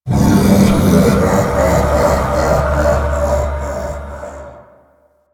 Laugh; Warframe; EntratiDoppelganger

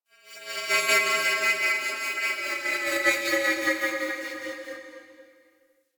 Distant Metal Textures, Asteroid; Ambience; Warframe; Grineer Asteroid

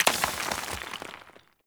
Thorn Bow Stone Arrow Impact Default; Weapon Impacts; Player; Envoy Fey Bow

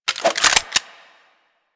Pistol Reload, Weapons; Harlequin

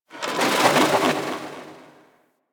Metal Scrapes; Ambience; Warframe; Corpus Railjack

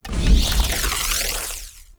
Thorn Bow Equip; Weapons; Player; Bows; Fey Thorn Bow

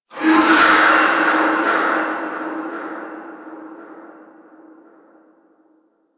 Stadium Infested Medium; Warframe; Ambience; Nineteen Ninety Nine

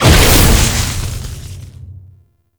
Enemy Spawn; Warframe; Man In The Wall; Enemy Gameplay

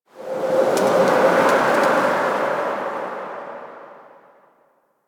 Exterior Forest Wind; Warframe; Ambience; Duviri

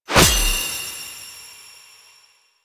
Parts Pickup; Warframe; Bard Quest; Mission Two

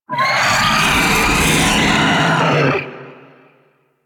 Vocal Pain, Enemies; Warframe; Teralyst; Ropalolyst

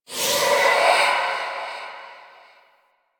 Evolving Weapons UI Node Select B; Zariman

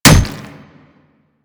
Claw Hit; Manic; Attack

